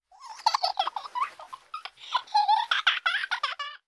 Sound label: corny laugh; emoji-tablet